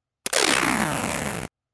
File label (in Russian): zvuk skotcha